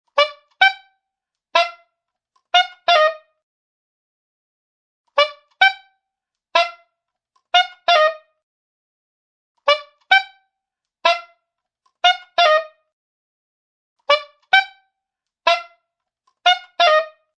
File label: Saxophone Squeaks Multiple; Music Ringtone; Musical